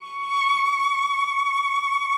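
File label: cello vibrato, C#6; Xsample VOL-7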